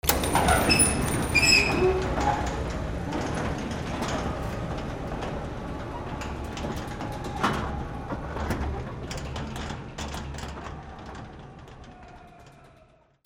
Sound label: DOORS